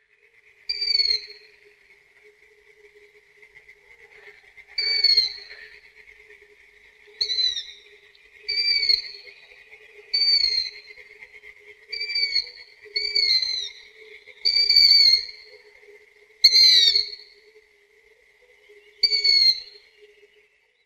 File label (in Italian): Capriolo Fischio; Capreolus capreolus; Versi Animali; Il Forum della Fotografia Naturalistica